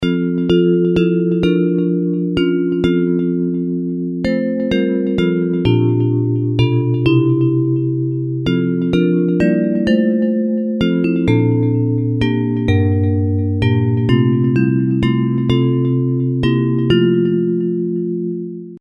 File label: Bells